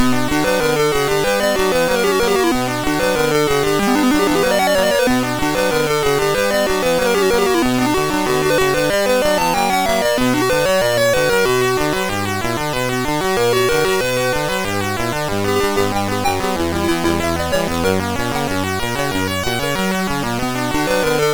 Classix, sid MOS6581R3; The Dungeon Master

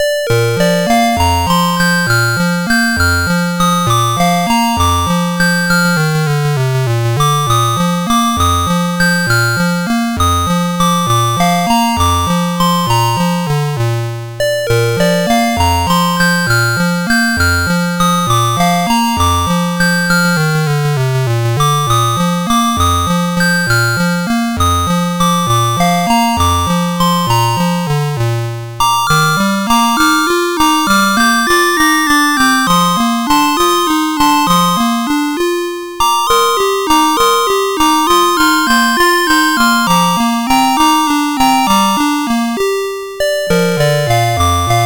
Waltz, MOS6581R3

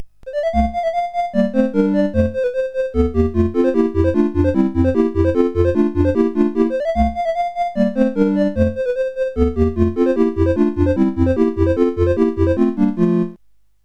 Season Jingles, CSG 8580; CSG8580R5

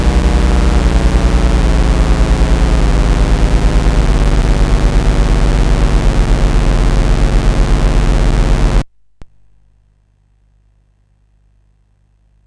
sid CSG8580R5; Rendezvous with Rama

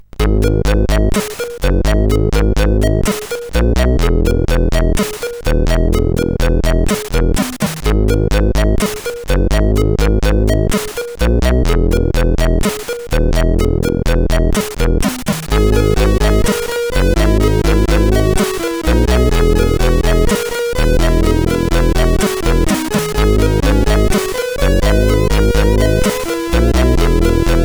Flying Birds sid CSG8580R5; hvsc

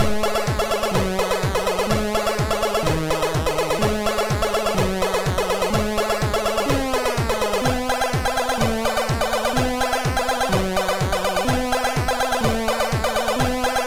Action News tune, MOS 6581

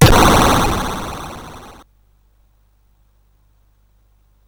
Taipan sfx, CSG8580R5

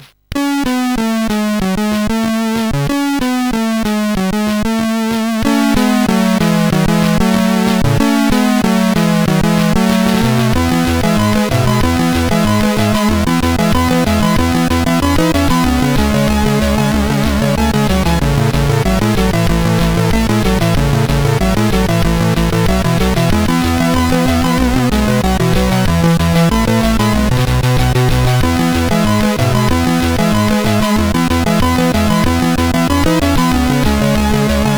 sid CSG8580R5; I Like It

